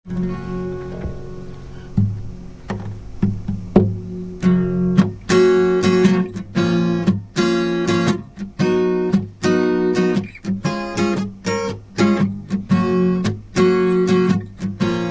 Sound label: Reggae; Native Noise Acoustic Collection